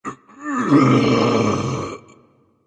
death; smite; anhur

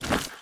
movement step; smite; god odin

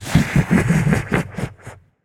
laugh; smite; khepri

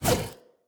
whip swing; smite; osiris

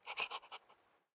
dog breathe; smite; god daji skin15

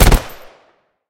basic fire; Smite; Zhong Kui; commando

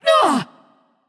grunt hit; smite; voicepack; hel

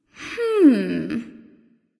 NV Thinking; smite; awilix

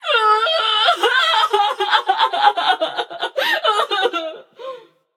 boohoo passion; chaac

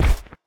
footstep, manticore; smite

